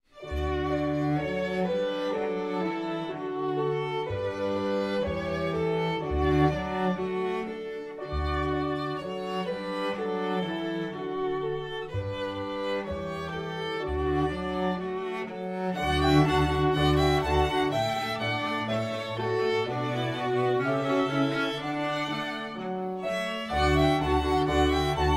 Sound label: Rigaudon